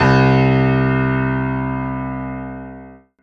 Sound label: Alesis Fusion Bright Acoustic Piano C2